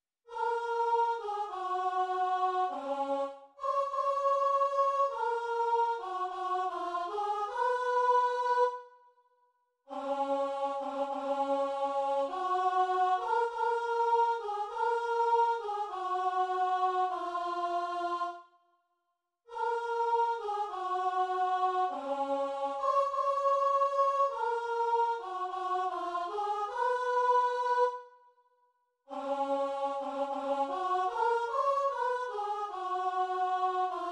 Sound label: chorus, soprano